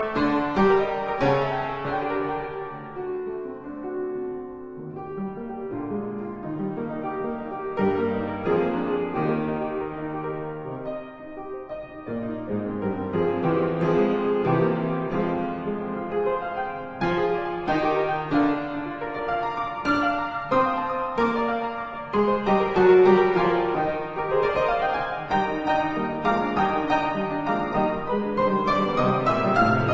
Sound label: Keyboard Grande Sonata in G minor, Allegro appassionato e vivace assai; Op 27